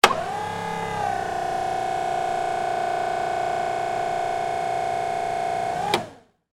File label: Forklift